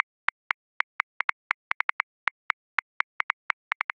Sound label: Hip Hop; Grooves; Inbetween Hip Hop 2